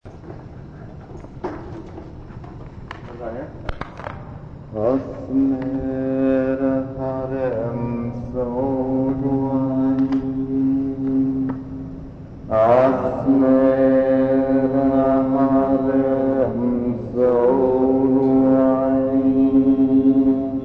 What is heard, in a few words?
Noise Mantra